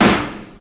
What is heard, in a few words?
boom snare single hit a sharp key, acoustic snare sample tuned to the A# note; snare drum samples